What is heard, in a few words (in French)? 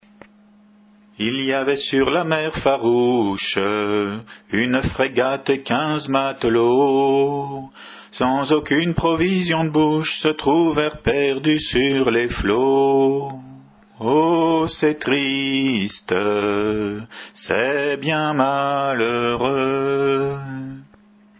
Chant Gallo, Chant à Répondre